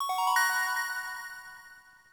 Menu Weapon Assemble; Custom UI Sounds; Stardew Valley

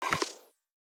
Menu Install Mod Gear; Custom UI Sounds; Call of Duty Modern Warfare (2019)

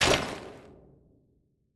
Malfunction Examined; Custom UI Sounds; Bloodborne

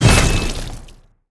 Button Bottom Bar Click; Custom UI Sounds; Bloodborne

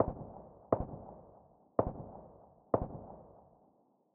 aug tap slow; SPT Battle Ambience

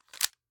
Menu Install Mod Gear; Custom UI Sounds; Resident Evil 2 (2019)

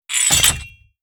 Menu Install Mod Gear; Custom UI Sounds; Call of Duty Black Ops Cold War

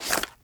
Menu Escape; Custom UI Sounds; Resident Evil 2 (2019)

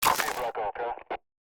Menu Open Container; Custom UI Sounds; Call of Duty Modern Warfare (2019)

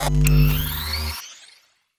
Menu Weapon Assemble; Custom UI Sounds; Cyberpunk 2077

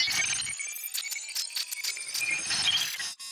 Generator Turn Off; Custom UI Sounds; Cyberpunk 2077

